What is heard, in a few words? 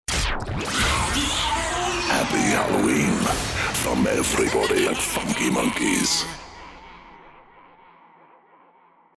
Happy Halloween Stab; Funky Monkeys; Generic Jingles